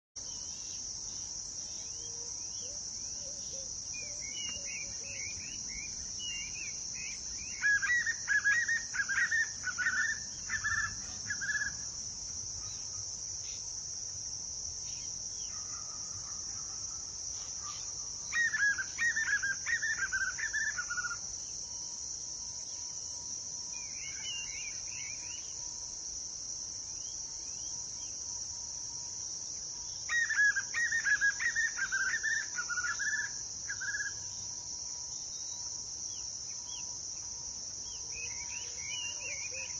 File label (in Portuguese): Canto do joao bobo